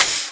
spid ATTACK; The Elder Scrolls III - Morrowind

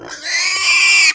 roar; The Elder Scrolls III - Morrowind

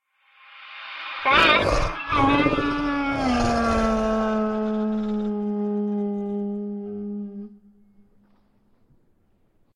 Hounds Roar; Hounds of Kintargo; SyrinScape SoundsScape Collection; bbh Haunting part 1; TTRPG

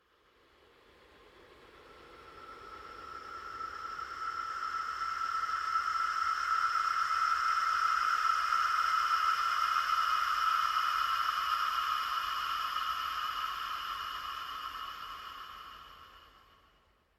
Ghost; SyrinScape; Clockwork entities

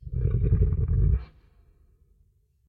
growl, Shadow giant grows and grumbles; Hound of Tindalos; SyrinScape; Iris hill manor

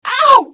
Hit; The Elder Scrolls III - Morrowind

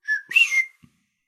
Whistler bird; SyrinScape; Syrinscape unplugged; Jungleplanet dinolaser battle

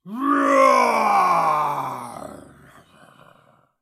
Dino roars, Jungleplanet dinolaser battle; SyrinScape; Syrinscape unplugged

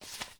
paper drag start; Papers Please

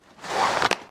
Rope pull; SyrinScape; Iris hill manor

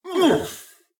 Combat; Eshimal; SyrinScape; Snarl and Oraculum

